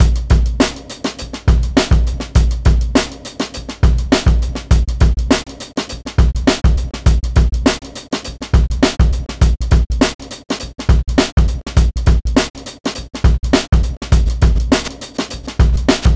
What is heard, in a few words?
Acoustic Drums; Reshuffle